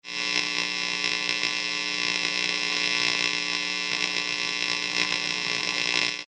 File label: Electric Buzz Bright, High pitched electric humm buzzing; high voltage; Electrical Sound Effects; Electricity sounds